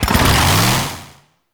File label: Chain Saw Tonfa Accent Rev Stance; Warframe; Grineer